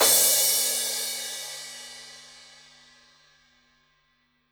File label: drum hit finish; osu